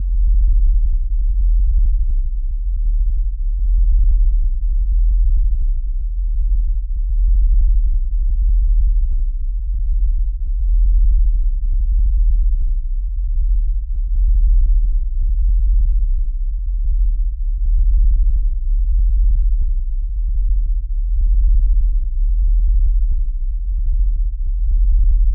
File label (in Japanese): 媚薬音「33Hz40Hz差音7Hz」; 絶頂脳イキ